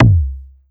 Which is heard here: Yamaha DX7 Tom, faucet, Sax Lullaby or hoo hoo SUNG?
Yamaha DX7 Tom